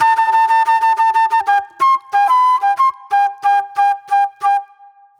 Soul-Jazz Flute, Call And Response; Sonic Foundry (Sony Creative Software); Manhattan Flutes

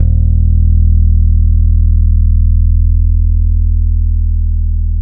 SOUL, Bass, F 2; Spectrasonics; Bass Legends

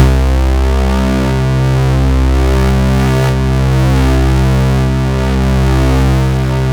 C2, Synth Line; 90 s Sample CDs; NiceBeats; SynthLine VOL-21

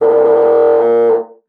MULTI, bassoon; Xsample VOL-6

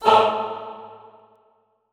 "fah", classical choir, A2; Best Service; Extended Classical Choir

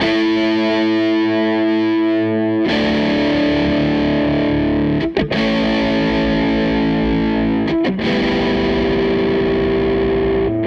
Les Guitar Loop

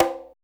RM CGA SLAP, congas; Drums In Your FACE!

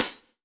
Main Room Closed Hat; essential drumkit

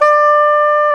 SAX SOP MF D, Soprano Sh; Roland LCDP07 Super Sax

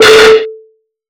Snares, Alesis Performance Pad

